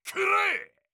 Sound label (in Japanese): 攻撃